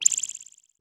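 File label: reverb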